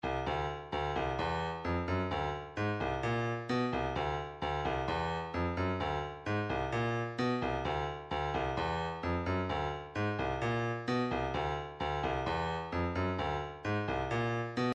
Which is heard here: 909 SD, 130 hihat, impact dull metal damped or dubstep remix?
130 hihat